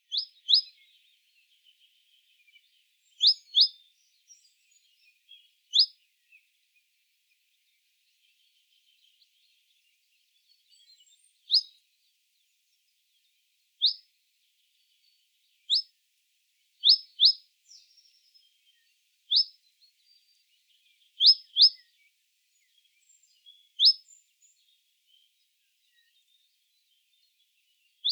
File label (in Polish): bogatka zwyczajna Parus major